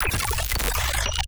konfeta; fx; base pack

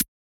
hihat; base pack; drums

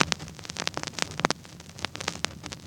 Vinyl; SFX; 7rystan's 700+ FREE COLOSSAL EDM SAMPLE PACK

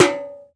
Can hit, Foley; SFX; 7rystan's 600+ FREE EDM SAMPLES & DRUMS

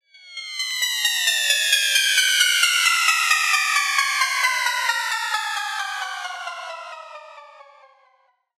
Sweep; SFX; 7rystan's 600+ FREE EDM SAMPLES & DRUMS

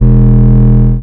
Trap bass, EDM

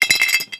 Glass shake; Foley; SFX; 7rystan's 600+ FREE EDM SAMPLES & DRUMS

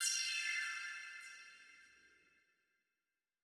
glitch F maj; fx; bespoke - Floral v.1

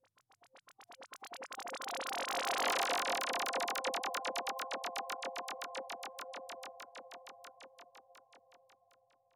pew pew pew sweep f maj; fx; base pack

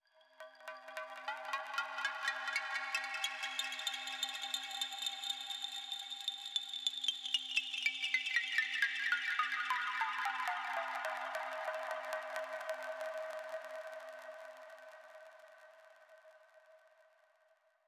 rain synth; fx; bespoke - Floral v.1

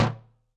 percussion; bespoke - Floral v.1

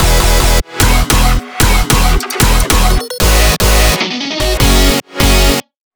bassloop Runnup B Maj 150; base pack

